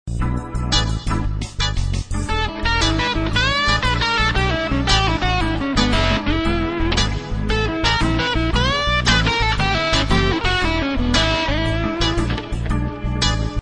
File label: blues